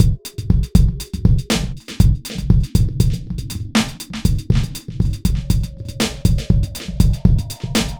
120 Eerie Dub No Perc, Drum Loops; 120bpm; Nu Dance Electro

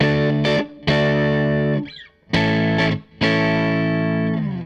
Gretsch Guitar Loop

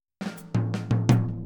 Boogaloo, Intro, Tom Rack, Drums, 82 BPM

